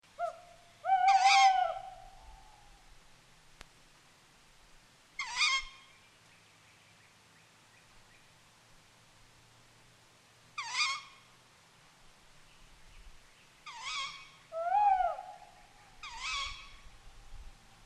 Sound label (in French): BIRD Prey Chouettes